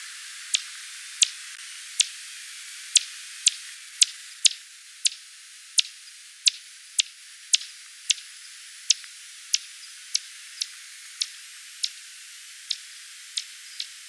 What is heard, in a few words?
M nattereri; Allier; M-Barataud